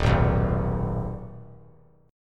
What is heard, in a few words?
D sharp Suspended 4th Sharp 5th; strummed; chord; 3,3,2,1; JGuitar